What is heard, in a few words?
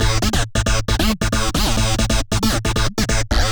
future rave samples, 136bpm, A; Synco